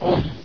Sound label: he idle, houndeye; Source Engine; bshift